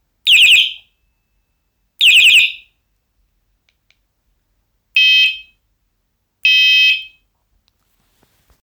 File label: Hornit